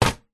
office slide projector vintage set down table 1960 voigtlander perkeo automatic; zapsplat